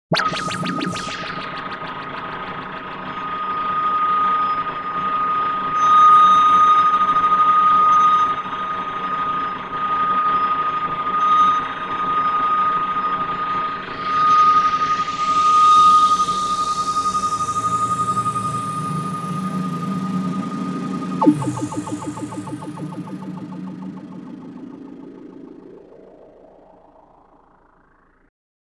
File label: Planetary Machine Communication; Bizarre Planet